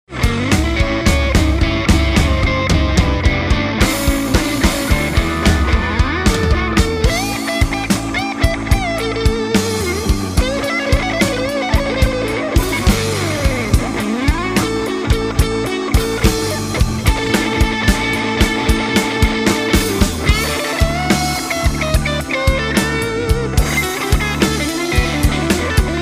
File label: Blues